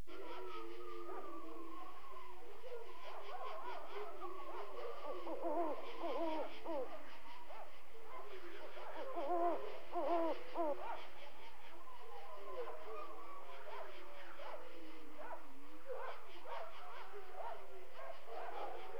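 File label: graveyard; 10,000 Graphics Pack Volume 1; Media Graphics International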